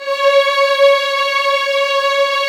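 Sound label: violins; String Master Series; Vlns 6 mf-f; Vls6 f amb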